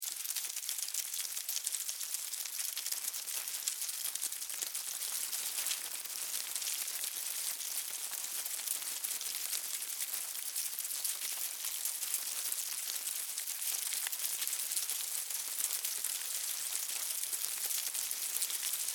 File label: Hive Forage Dig, Bugs, Movement, Insects; Animal; Blast Wave